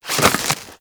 Generic Roll Ice; Warframe; Characters

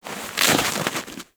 Armadillo Move Snow; Warframe; Characters; Hunting Critters

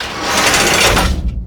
Pull Vent; Orb Vent Finisher; Warframe; Venus; Orb Heist; Cinematics

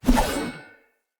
Short Sword Heavy Whoosh; Weapons; Player; Swords; Sollos

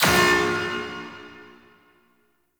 Puzzle Projector Activate; Warframe; Missions; Raid; Grn Puzzle Switch

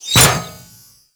Kunai Impact Default; Warframe

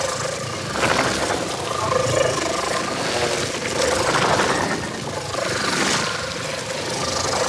Jump Pad Loop; Gameplay; Warframe; Jade Shadows; Ascension

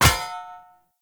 Short Sword Medium Parry Impact

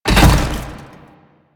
Motorcycle Collision Fast; Warframe; Motorcycle Ninety Nine

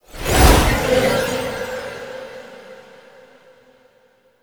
Horse Disappear; Vehicles; Foley Actions; Warframe; Duviri

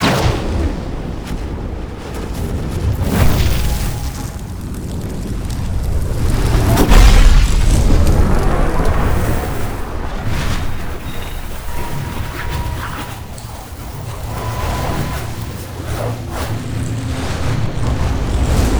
Betrayal Energy Sequence Stereo; Warframe; Warframes; Pax Duviricus

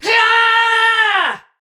Attack Effort; Warframe; Tau; Dax; Ninja

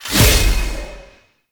Impale Cast Release; Warframe; Hoplite

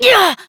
Exert Adult, Melee Swing; en; New War; Adult Operator

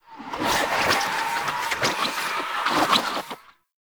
Blade And Whip Idle Spin Whoosh; melee; weapons; Warframe; Dagath Blade And Whip

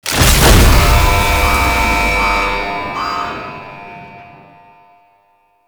Elevator Stop; Jade Shadows; Ascension

